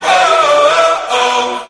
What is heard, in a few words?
"woah oh oh oh oh" SUNG; ooh ahh